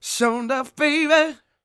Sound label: SING W, Vocal Planet Blues & Soul, BLUESY; ILIO